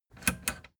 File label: plastic button latch click enable slow